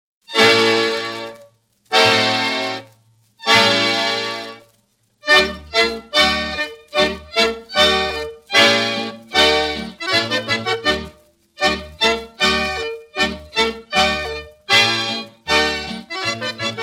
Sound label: Dance of Greeting - Danemark